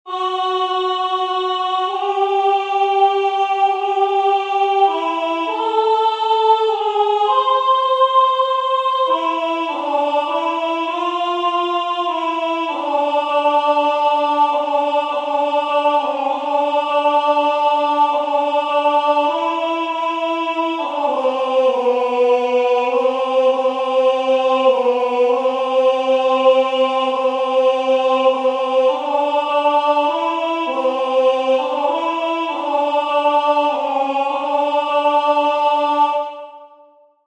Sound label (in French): 2 verset 23 Alto; Monteverdi; Vespro; Baroque